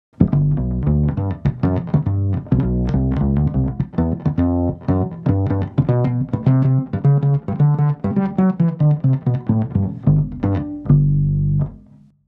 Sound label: Ahorntop, bass